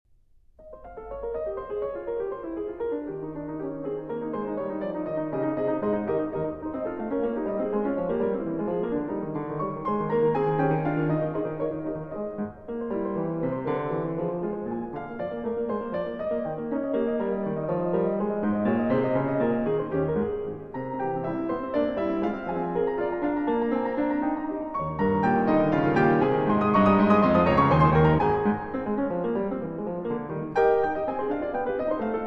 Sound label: Piano Sonata No. 12 in A-Flat Major, Op. 26 Funeral March IV. Allegro